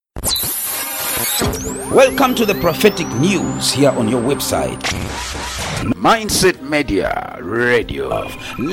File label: jingle ENGLISH; Mindset Media